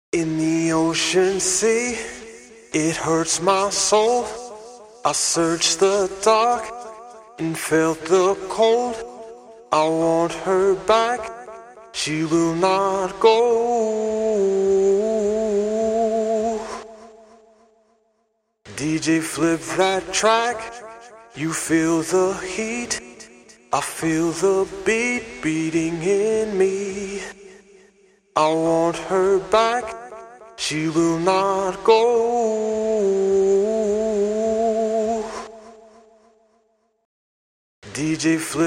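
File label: dance pop, 100, A major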